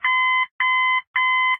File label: radio call; device